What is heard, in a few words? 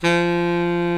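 Tenor Sax G; TSX TENR SAX; Giga Samples Collection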